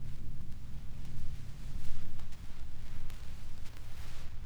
Tape Noise

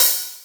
Open Hihat

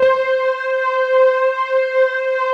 MUTE PNOS, JV PADS; Pro-Rec - Synthsonic

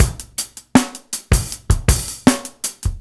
LOOP 79; AMG Gota Yashiki Groove Activator

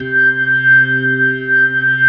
C3S, B3

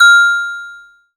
BELLS, JAPANESE PERCUSSION; AKAI S6000 CD-ROM - Volume 5; Ethic

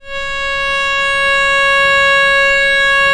VL C#4, VIOLE, Historical Instruments; USB Soundscan vol.23

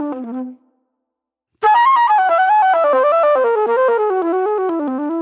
Soul-Jazz Flute, Call And Response

